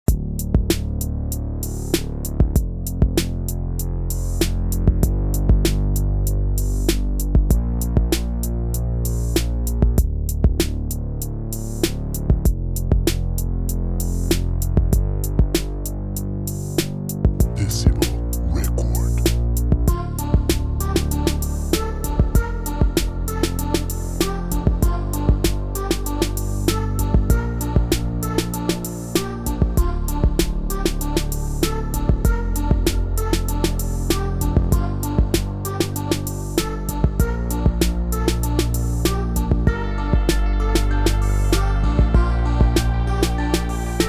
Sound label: Gang tune 97 BPM